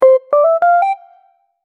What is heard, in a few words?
sound of a successfully